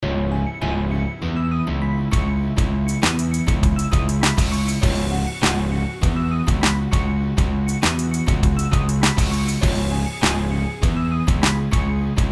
loop rhythm sixteenth china cymbal; songsnacks

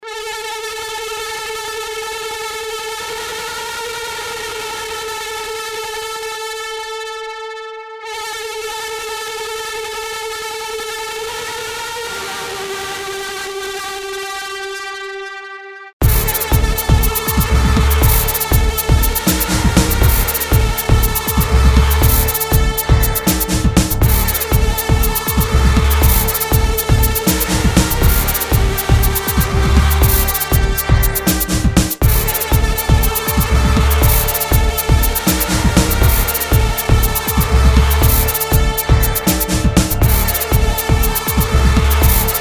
loop distorted synth drum noise rhythm; songsnacks

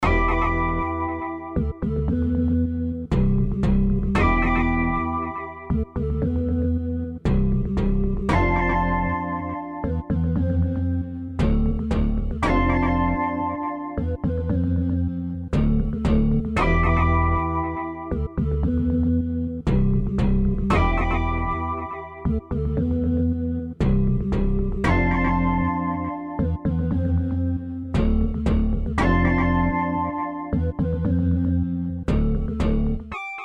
loop synth bass organ saw delay melody; songsnacks

